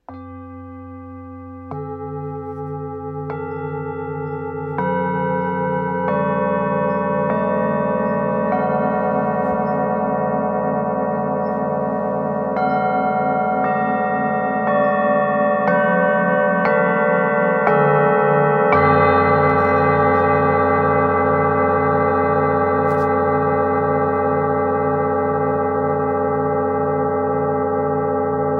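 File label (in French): Set therapeutes bengale, bols chantants tibétains; jupiter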